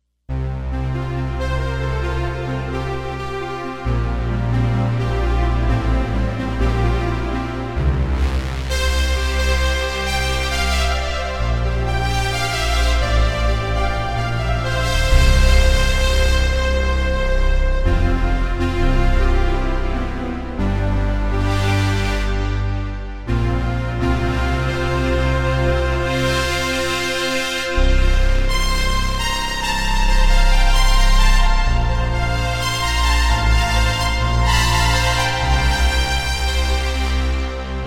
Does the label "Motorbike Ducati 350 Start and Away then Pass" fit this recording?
No